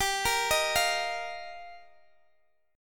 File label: G Minor 7th Sharp 5th G down Guitar DADGAD; arpeggiated; Gm7#5